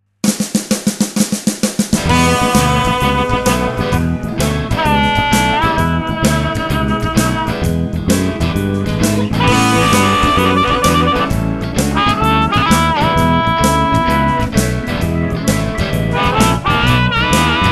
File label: little harp, ampli lampe